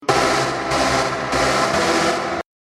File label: Raver Txt Tone